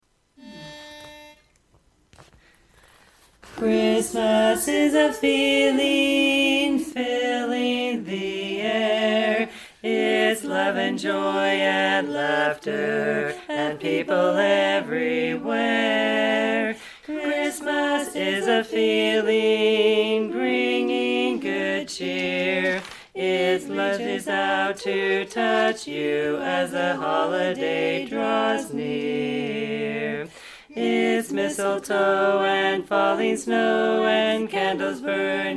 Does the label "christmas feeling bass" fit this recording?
Yes